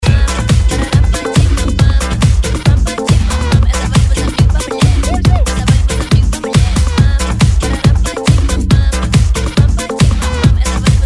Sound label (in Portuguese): sertanejo remix e electro dance